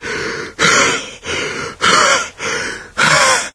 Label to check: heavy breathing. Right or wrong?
Right